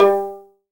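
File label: violin pizzicato G 2, solo violin; AKAI S-Series CD-ROM Sound Library VOL-7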